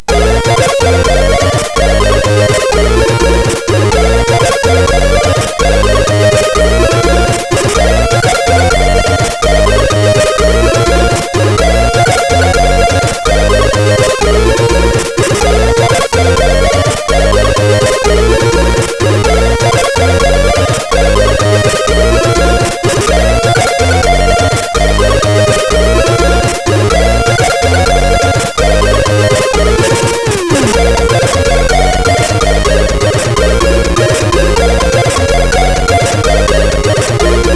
Remix, MOS6581R4; Druid 2

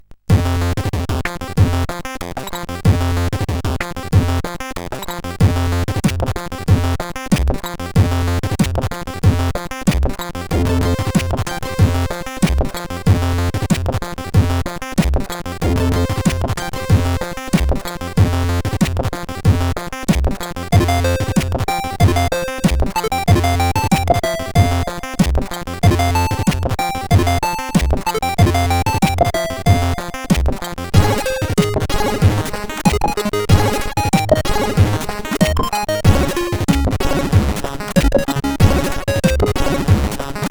Scifi, CSG8580R5; Mythus; Create

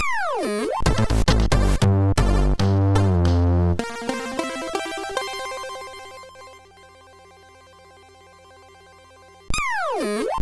Su-Sweet, MOS6581R2

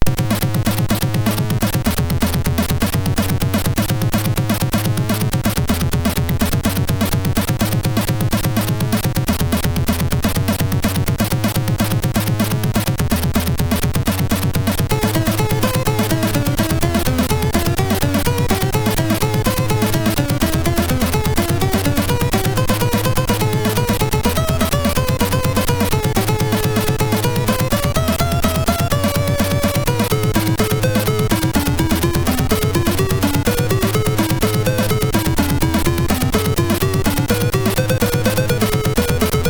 sid, MOS6581R3; hvsc

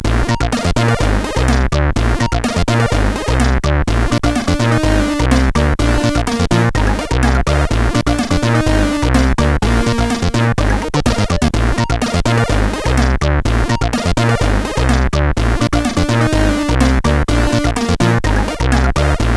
Rumble sid CSG8580R5; Jadawin; hvsc

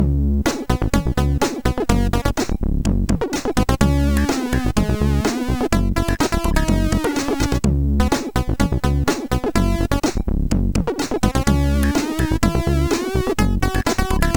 Poly 800, MOS6581R4

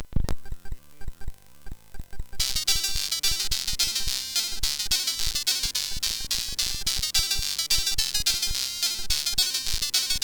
String, CSG8580R5; hvsc

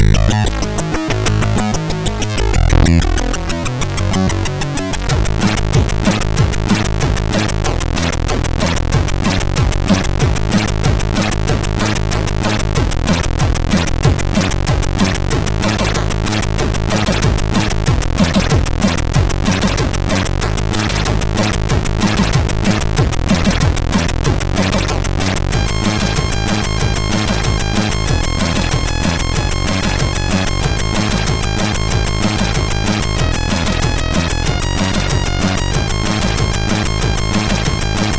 Acid Rain, sid MOS6581R3

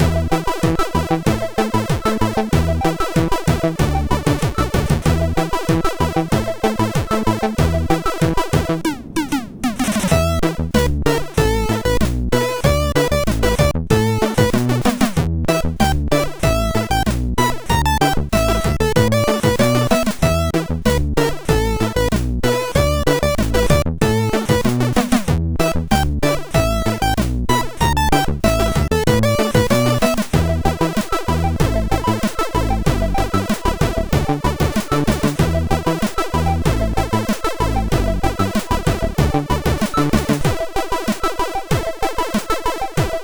Disco Bass, MOS6581R4

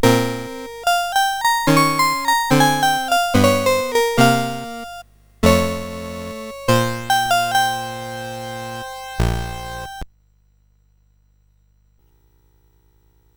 Mini Piano Demo, CSG8580R5

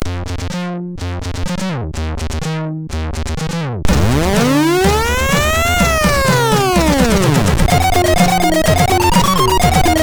Heavy Metal, MOS6581

